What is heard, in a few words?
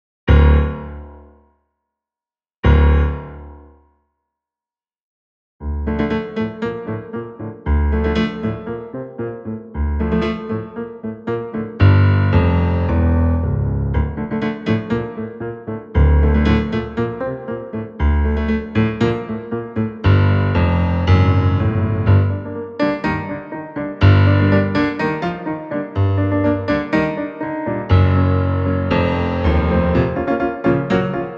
Dark Piano